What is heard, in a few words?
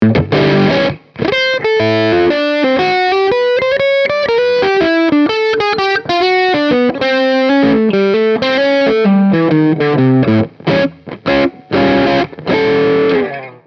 bridge coil-split distortion, yamaha pacifica 112v